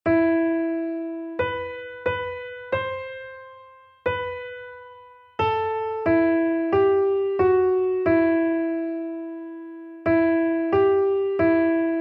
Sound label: melody; o lord how many are my foes